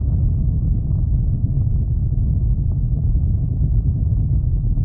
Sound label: cave outdoor, ambient atmosphere